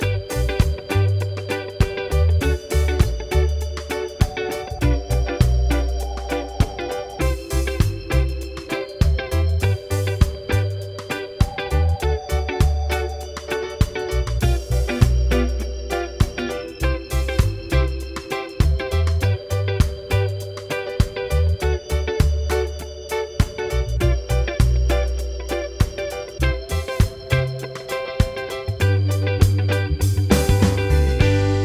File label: progs with applied chords